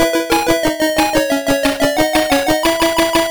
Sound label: Bonus Start; bonanza bros master system